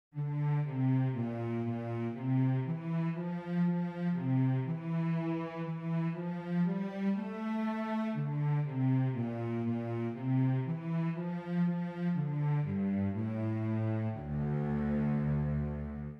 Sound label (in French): VIOLONCELLE